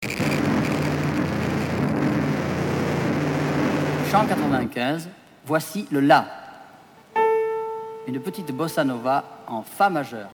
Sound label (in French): noise le la bossa nova en fa majeur